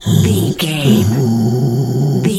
bear roar soft

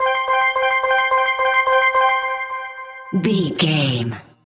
point sticker bonus score reward many

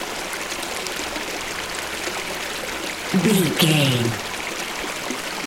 river stream flowing water loop short version

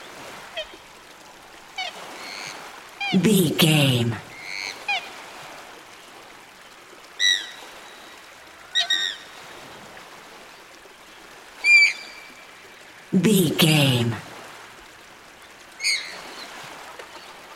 ducks birds lake ambience water flowing loop

